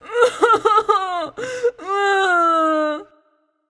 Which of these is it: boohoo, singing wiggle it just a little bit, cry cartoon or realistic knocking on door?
boohoo